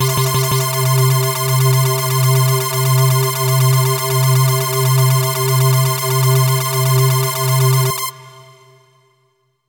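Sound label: cyber attack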